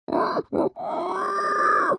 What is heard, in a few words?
pig med howl